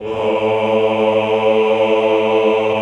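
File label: choir A1, Aaahh; Sound & Vision - Gigapack I CD 2 (Roland)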